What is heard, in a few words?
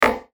Error Message; Electrical Button; Multimedia; Electrical Buttons; Blastwave